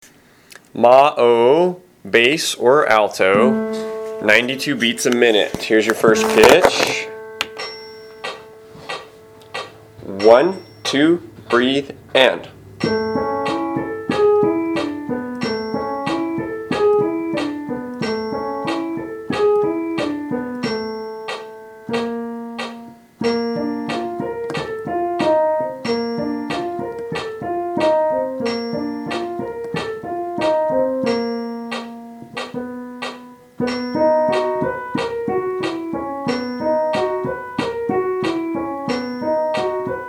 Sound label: alto bass 92bpm